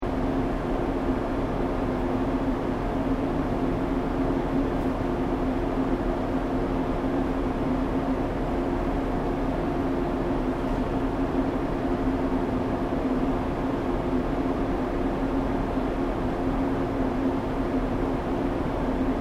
Train Aomori Series E731 Express External Venting Idle Loop, Japanese Trains; Train Sound Effects; Vehicles